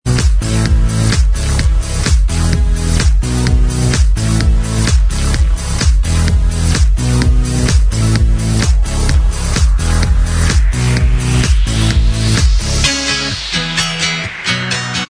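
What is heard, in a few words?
instrumental, backing tracks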